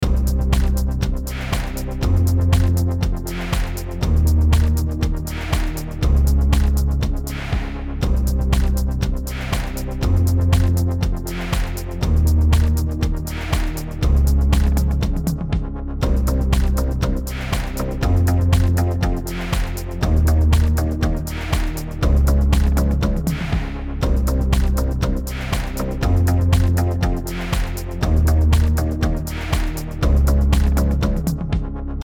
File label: LOOP